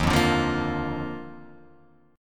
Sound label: Eb Major 7th Suspended 2nd Eb Guitar Open F; strummed; 3,0,2,0,1,0; EbM7sus2